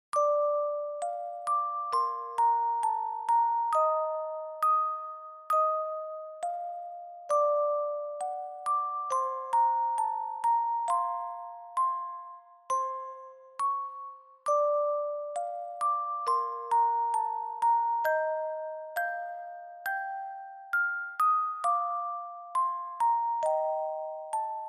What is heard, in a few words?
Reverb Slow; bgm; Salut dAmour